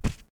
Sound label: Walk; portal quest; android; Dumbledore